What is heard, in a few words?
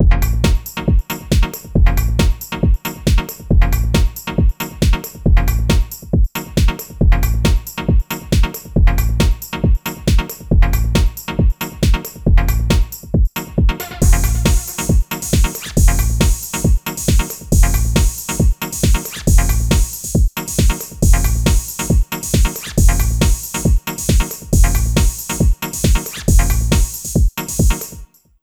Old Skool House, 137 bpm; Belfast Demo; Big Fish Audio; OSH Construction Kits